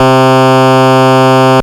Sawtooth C2; Ableton Foundation FreePacks; Foundation Glitch Toys & LoFi

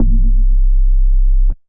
Add Square Shifter, Bass; Ableton; Skitter and Step

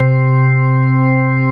Basic Tonewheel Organ; Electric Keyboards; Ableton; Factory Packs

